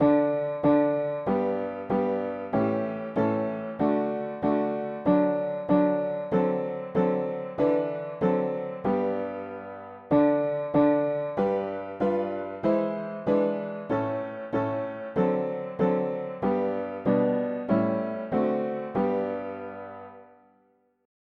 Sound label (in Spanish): mono piano; hymns; Himnario pequeno 2Pte